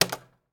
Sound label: Light Switch; Deathrun